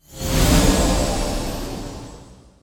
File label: basic buildup; smite; chronos